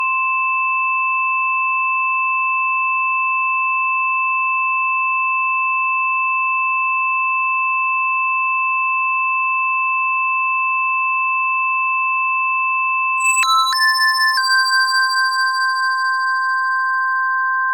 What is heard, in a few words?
riff